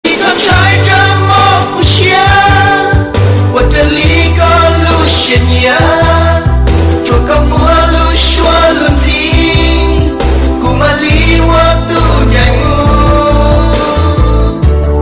Hmong Sad Song

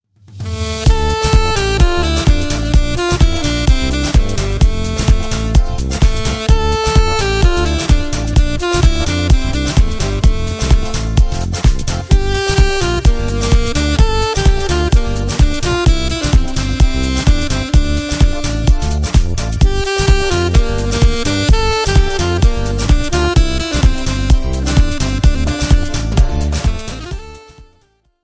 Sax Ringtone

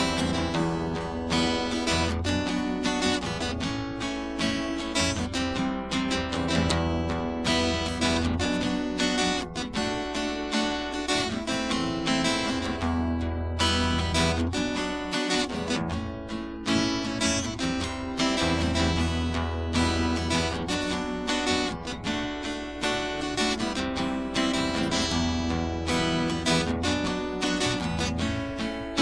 Accoustic

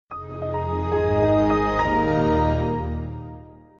Windows Xp Start Up

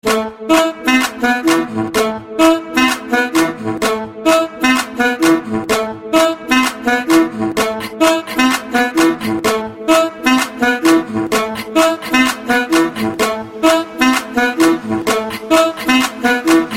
Sax Effect

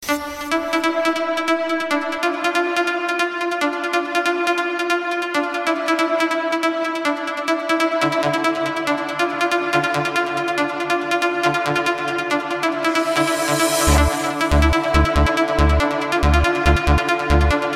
String Ring

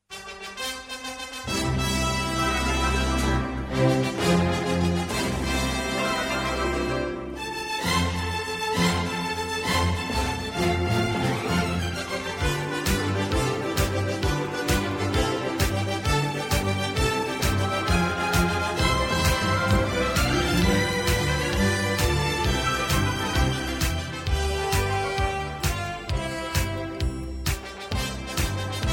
Mariachi

